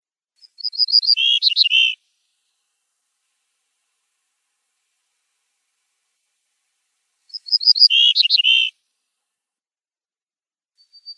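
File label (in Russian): Ovsyanka skalnaya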